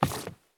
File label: stop wood; Escape from Tarkov